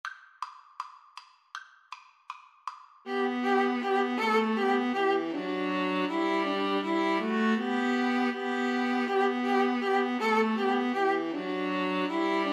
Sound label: good king, string trio